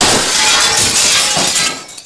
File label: glass; WhiteOut